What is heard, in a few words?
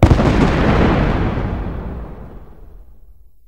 meteor distant explosion